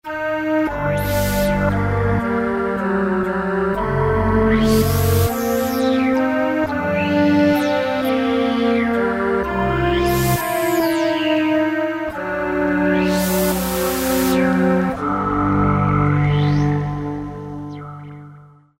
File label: Roland JD-990; Factory Patches examples; Draw....